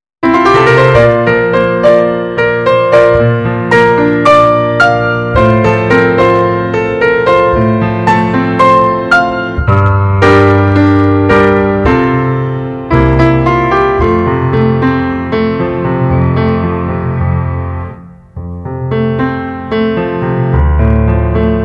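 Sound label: piano instrumental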